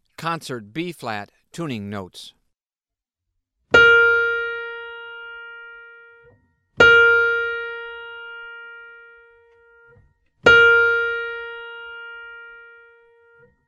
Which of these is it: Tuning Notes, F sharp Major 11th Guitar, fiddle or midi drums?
Tuning Notes